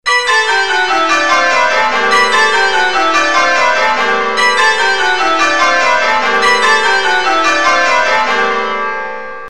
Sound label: Rounds, changeringing